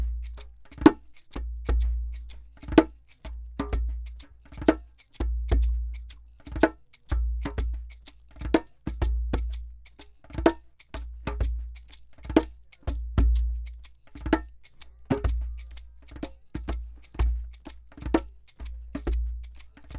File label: percussive